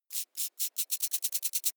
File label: creature movement tiny; bewegung; comedy-cartoon; soundpool